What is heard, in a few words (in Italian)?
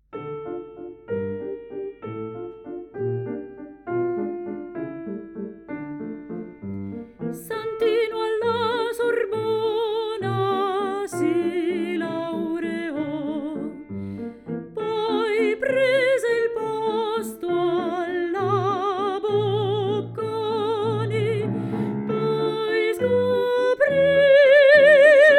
Voce; Pianoforte